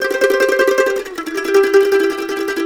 COUNTRY

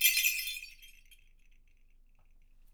JINGLE, Percussion; Miroslav Vitous

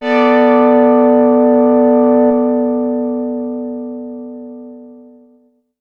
diggin, excessive stereo; E-MU Producer Series Vol. 6 – Analogia Project; Strictly Synthe

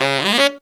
TEN C# SR, Phantom Horns TENOR FX; Zero-G

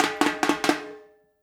FILL, Percussion

